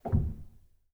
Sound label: rel, Grand Piano; bitKlavier Grand; Piano Bar